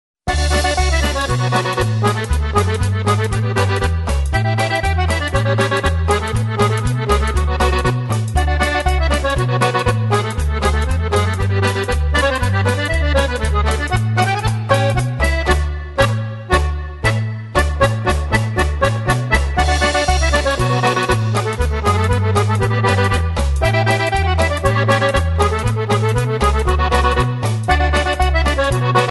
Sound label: Polka